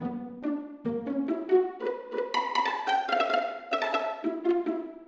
AM FM Violins

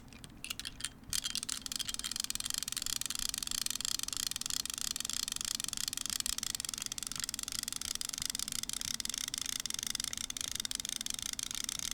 Fishing Reel

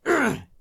Male Hurt

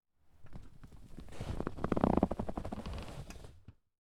Leather Seat Grinding On Chair

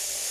Looped Skidding Sound

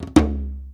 80bpm dry break

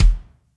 Big Juicy Kick

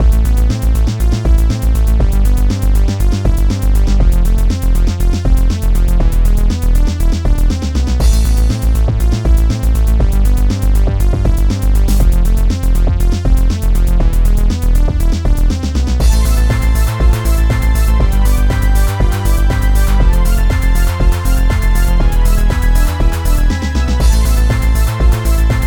Short Loop; Made In A Few Minutes With Qws And Goldwave

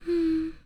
Girl Hugging

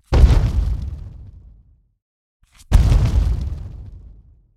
Impact Of A Heavy Boulder Designed

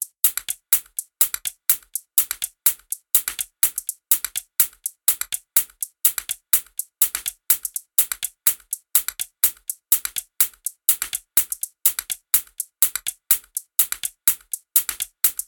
124bpm

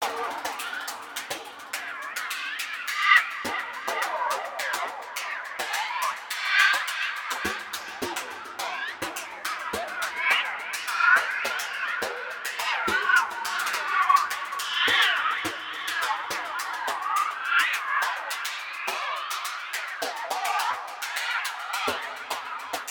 Ricochet Drums

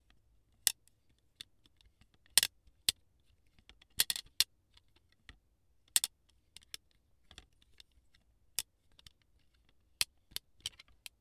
Sunglasses Plastic Frame

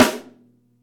Snare

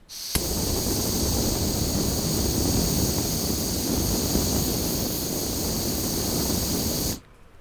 Burner Gas Flame Sound

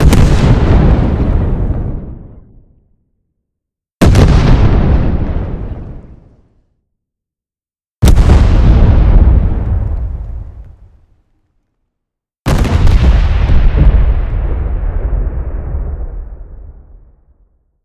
Beefy Explosions

